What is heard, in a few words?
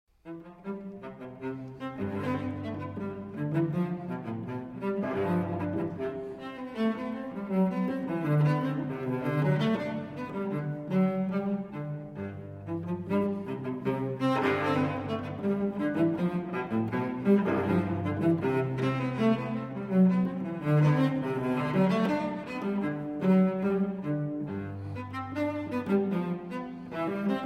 Unaccompanied Cello Suite No. 3 in C Major Bourrees I & II; BWV 1009